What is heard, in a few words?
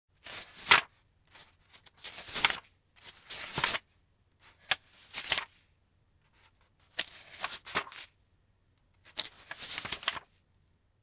Book pages